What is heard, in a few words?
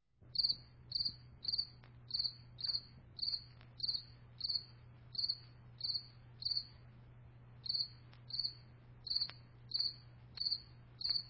cricket